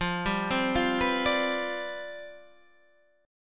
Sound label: C Major 7th Suspended 2nd Suspended 4th F, chord